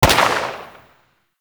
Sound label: Uzi Pistol Shot; Warframe; Grineer